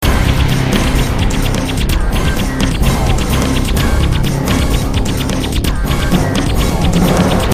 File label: loop